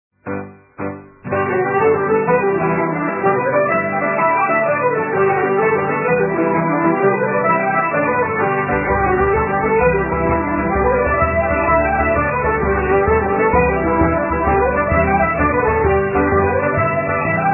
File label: lofi; Drumshabo / Butchers March / Connaughtman's Rambles (Jigs)